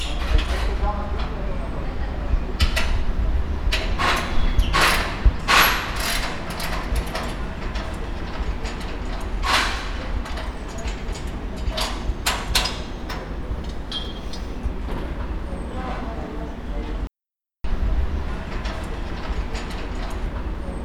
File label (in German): Geruestbauer; ZOOM